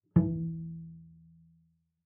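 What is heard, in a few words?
Bass pizzicato fortissimo sul A E2, Double Bass; MIS Pitches - 2014; Strings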